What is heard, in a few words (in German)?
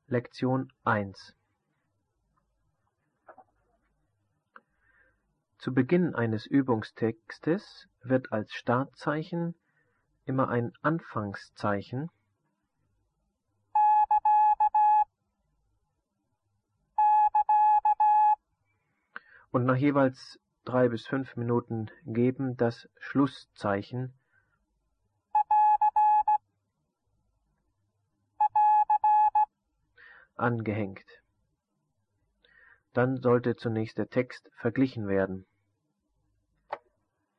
Morsen; Onlinelehrgang